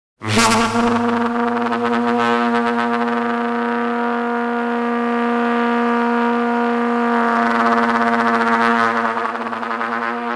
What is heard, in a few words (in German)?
Trompete